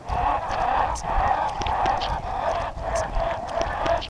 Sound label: Beak Gibbering; A Robot Named Fight